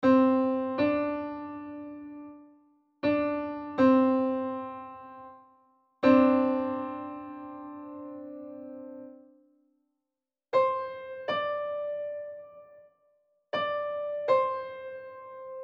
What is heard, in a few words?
major 2nd